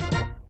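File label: fanfare end of ball, pinball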